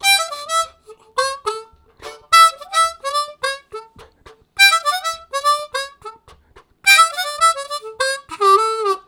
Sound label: High Ender, Harmonica Riffs; Zero G